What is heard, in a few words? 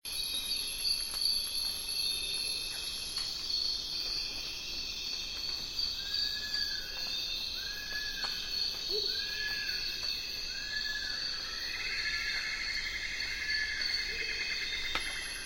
Tropical forest morning; children of morta; Tutorial